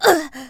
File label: Shock Burn; Link getting shocked or burned